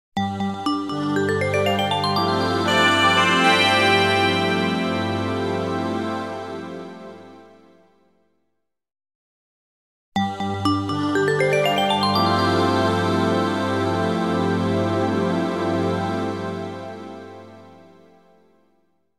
Station Call

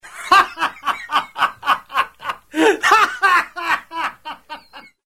LAUGHTER SINGLE; Rosegarden

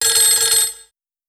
Ring (caller in); Rosegarden; russia

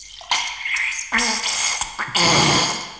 squirt; sound effect; Nare Gewoontes

